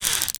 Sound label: head twitch, npc dog; scripted